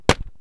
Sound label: stickfighter attack; propack